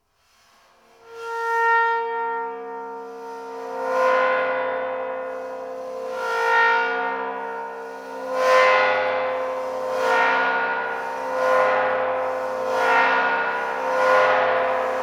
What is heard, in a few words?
2 spot process, bowed cymbals